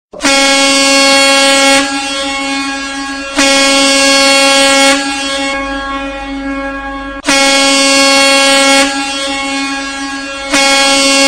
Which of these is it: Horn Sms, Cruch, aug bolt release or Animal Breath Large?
Horn Sms